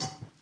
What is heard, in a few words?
cooking flour